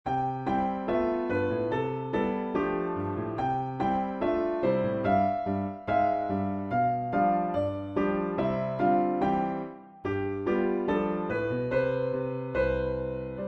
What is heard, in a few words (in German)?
Kastanienmaennchen Marsch